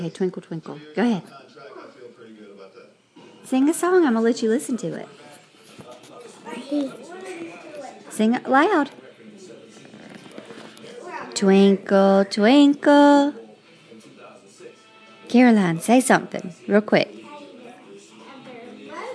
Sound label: singing 2yrs old